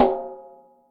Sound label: SDdkrim, Electric Snare, Yamaha DTXpress